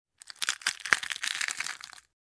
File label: Crunching